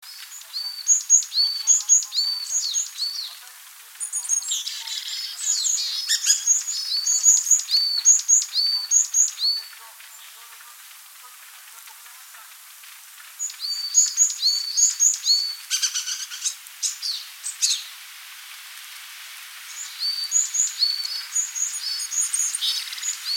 Parus varius

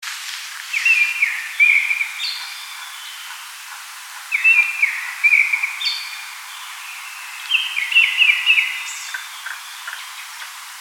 Turdus cardis

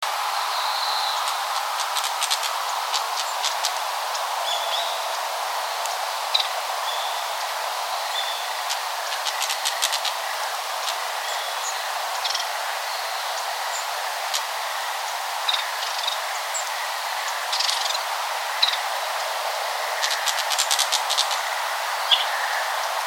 Ficedula narcissina

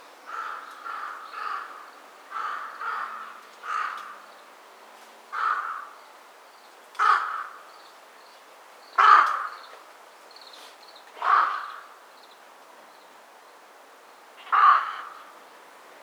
Corvus corone

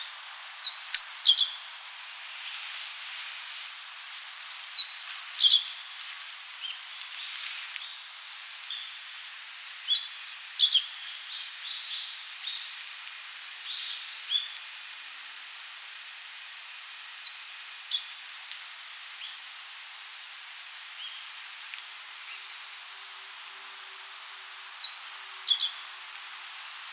Emberiza cioides

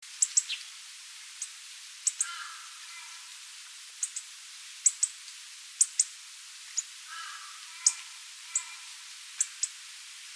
Coccothraustes coccothraustes

